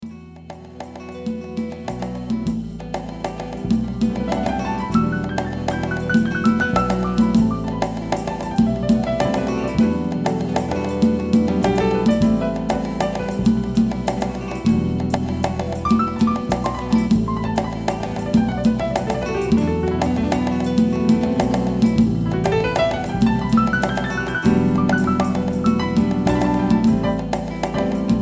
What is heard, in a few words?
Piano Solo